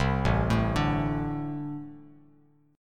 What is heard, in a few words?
A-Minor Double Flat 5th A Bass Standard; arpeggiated; JGuitar